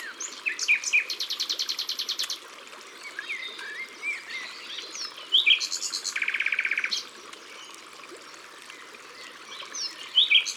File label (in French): Rossignol philomele; abc de la nature